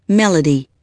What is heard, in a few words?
MELODY